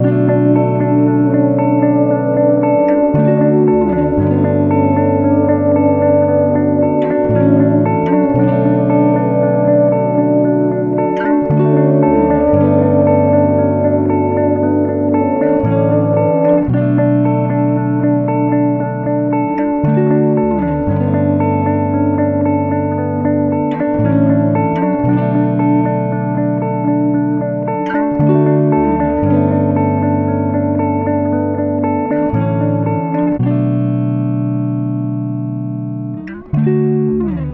115 BPM B Min, Guitar; Dream State; Cymatics; ZODIAC Beta Pack

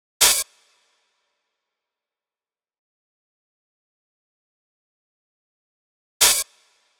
Open Hihat 160, Trap Drums; Stems; Achieve Drum Kit

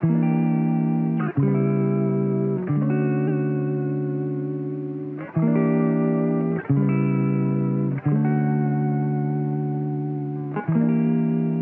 Electric Guitar Wet 90 BPM C# Maj; Melodics; Cymatics; Eternity Sample Pack; Guitars - Electric

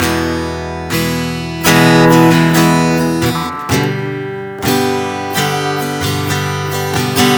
Guitar 130 BPM (A Minor), Acoustic Guitar

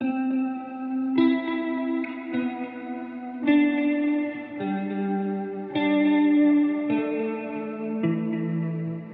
105 BPM A Min Guitar; Loop Stems; Cymatics; Cymatics Gems Vol 6; Confessions

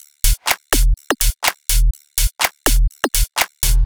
Top Loop - 124 BPM E, Top Drum Loops; House; Transmit; Cymatics

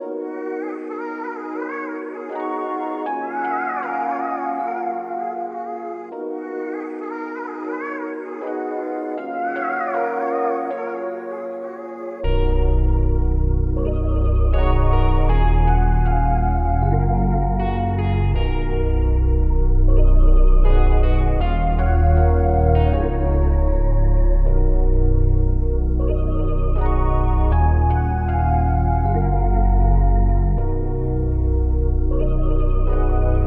157 BPM D# Min, RnB, Melody; Free Your Mind

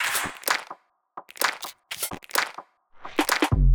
Go Deeper Top Loop - 128 BPM E; House; Top Drum Loops; Cymatics

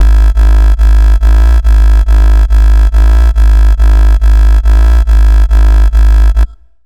Bassline C3 140, Dubstep; GhostHack

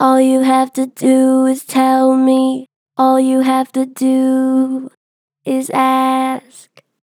Pitch Flat Gentle, Vocals; All you have to do; Harmor Vocals

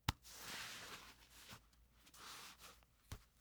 Hands Dry on Jeans; Fairlight Sound Lib

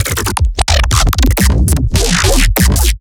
Riddim Bass Shot Crunchy; Dubstep; Riddim Basslines; Ghosthack; Advent Calendar 2019 Day 17; Riddim Bass Shots

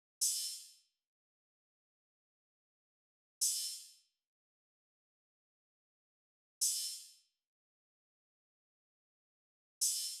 Drum Loop - 150 BPM Open Hat; Loop Stems; Cymatics; DOPE DRUMS VOL. 1; Worlds

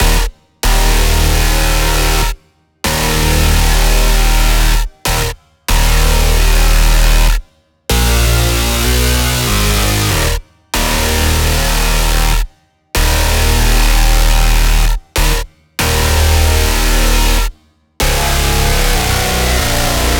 Bass Loop Gunshots D#m 95BPM; Dubstep; Ghosthack; Advent Calendar 2022

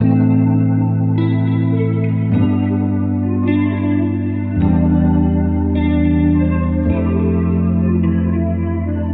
105 BPM A Min; Gems Vol 6; Confessions; Cymatics; Gems Free Teaser Pack

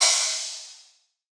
Crash, Cymbals; Cymatics; Eternity Sample Pack; Drum One Shots

